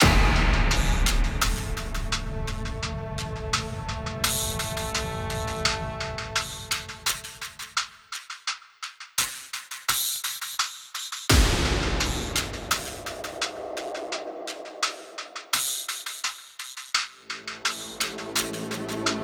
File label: drumfxcomp cinematic; by burak sound bank; tension gerilim vol 52